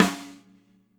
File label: Snare Rimshot Soft